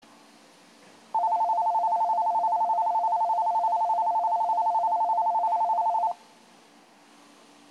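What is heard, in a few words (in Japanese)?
10番線発車ベル, 仙台駅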